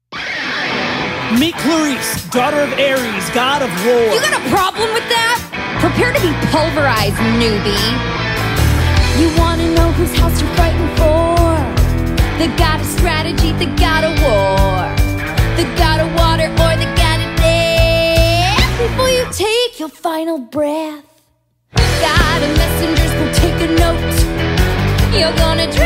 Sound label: vocals